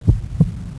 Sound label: heartbeat; sven; aomdc; player